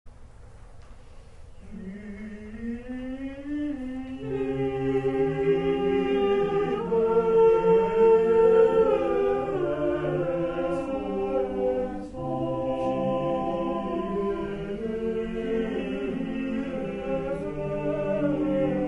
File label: bass